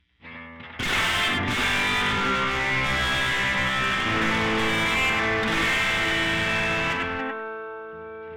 tube delay

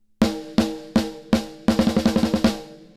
snare; A good starting point

